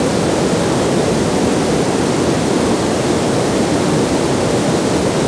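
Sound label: waterfall large loop; water splashes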